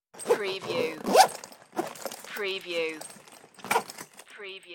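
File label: Zip Luggage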